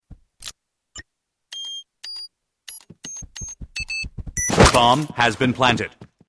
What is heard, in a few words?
Bomb Planted